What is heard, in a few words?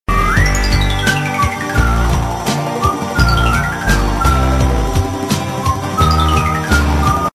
new air sms ringtone